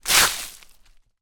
foley foot kick dried leaves on small wooden bridge forest